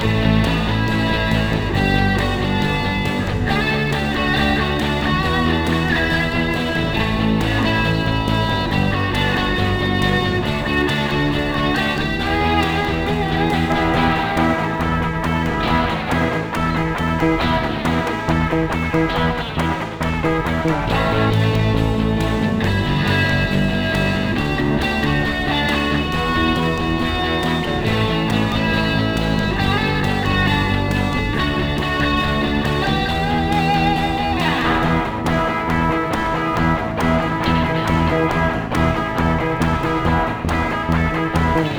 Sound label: music kart menu; Ty The Tasmanian Tiger 2 Bush Rescue; XBOX